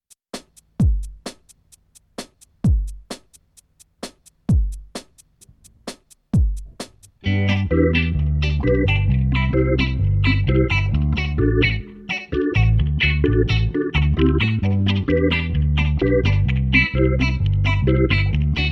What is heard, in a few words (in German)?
Ska-Feel; Bum Bum Tschack 1